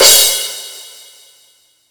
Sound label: Crash Cymbal, Yamaha PSR E305